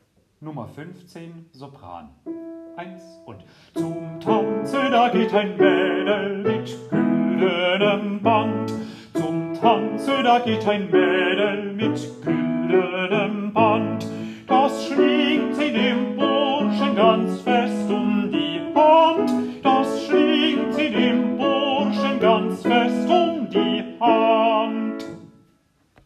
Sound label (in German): Sopran; Dioezesan Caecilien Verband; DCV Chorfahrt; Übaufnahmen